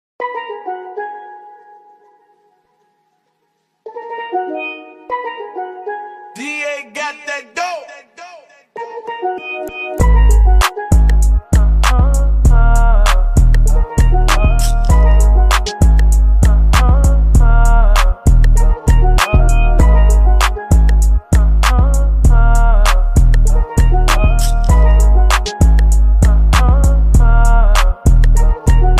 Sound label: Intstrumental